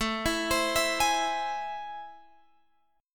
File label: A Minor Major 7th, down, Guitar, Drop D